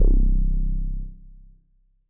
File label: M Elec Bass C1; KG MegaSaur